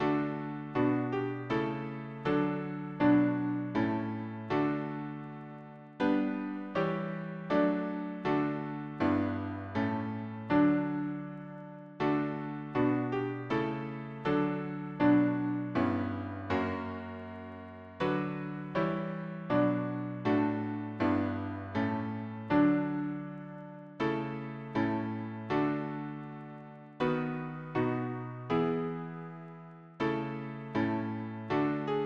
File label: harmony piano